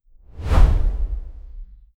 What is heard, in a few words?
smooth sliding, ascend